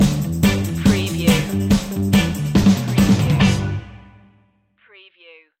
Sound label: rhythm kings stinger